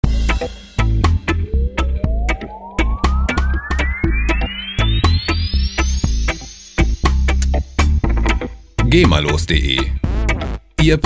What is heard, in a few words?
modern reggae